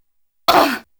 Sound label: hit; 夏洛特聲音資料庫